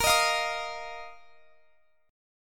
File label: A sharp Suspended 4th A sharp down Guitar Open F; strummed